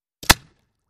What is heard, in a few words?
Bullet Impacts Water